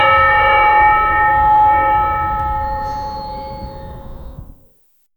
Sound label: church bell; ceremony